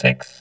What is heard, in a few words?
VOX VOX, Electro Vox